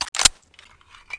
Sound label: 9mm handgun mag in, weapons